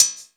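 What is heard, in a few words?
closed hi hat, Drum & Bass; Ueberschall; Drum & Bass Resonance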